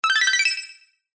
game sound digital high pitched positive success complete tone